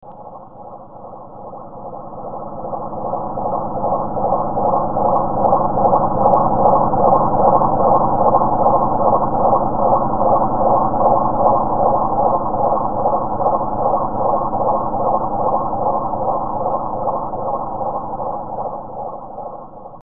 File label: pulsar 1 pulse; psr1937+21